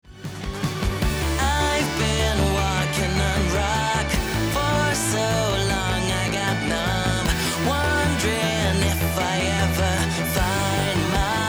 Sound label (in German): gesang; LOFT VOICES